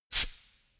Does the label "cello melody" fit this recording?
No